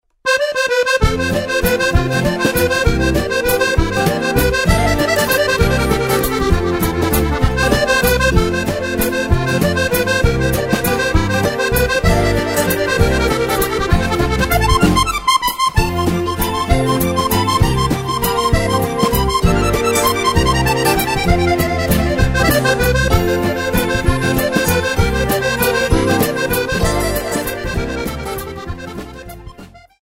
musette symphonie

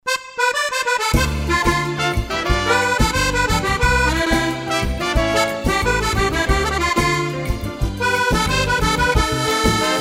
rumba

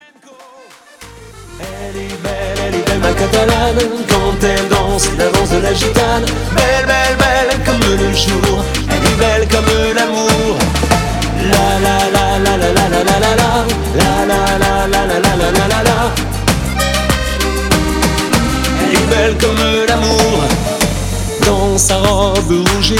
danse gitane

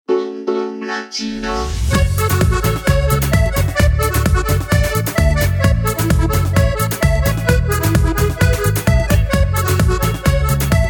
kuduro latino

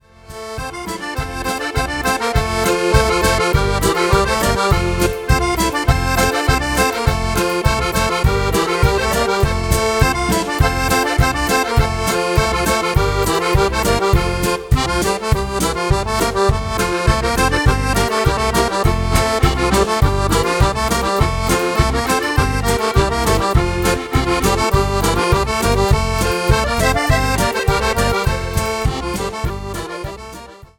polka auvergnate

